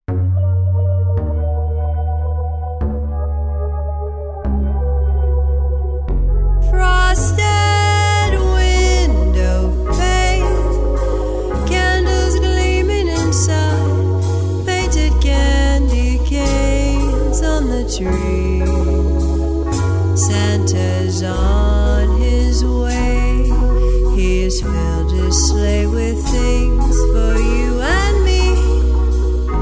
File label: xmas waltz